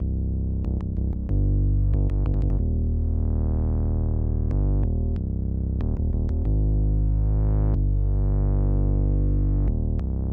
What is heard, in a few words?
Subass 93bpm